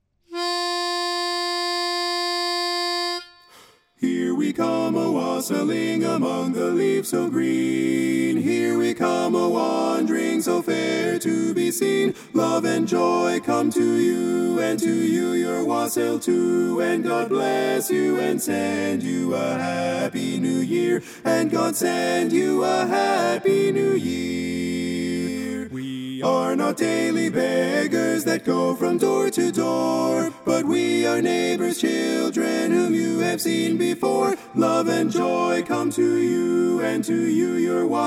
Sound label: TENOR